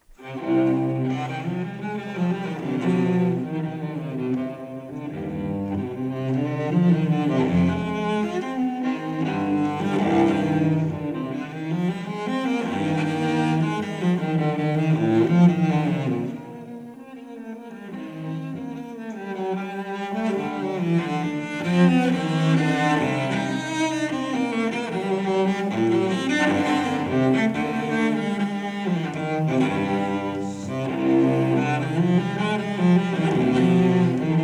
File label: Cello Suite; Bach; No5; BWV1011; Rostropovich